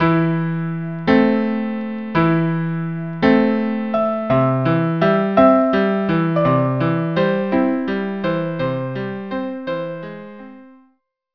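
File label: violin viola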